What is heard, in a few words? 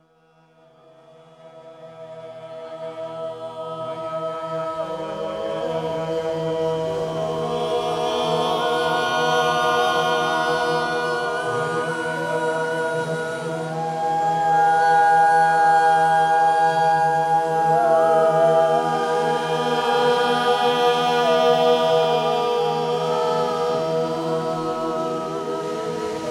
chant; Bahauallah